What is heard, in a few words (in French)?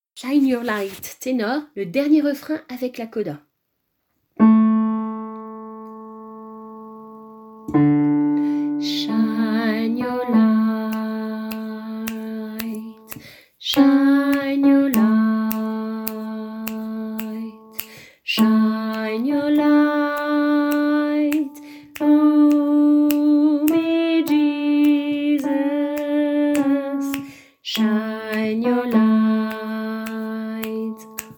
dernier refrain tenor